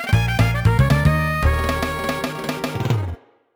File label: Lose Life; Donkey Kong Country 2- Diddy's Kong Quest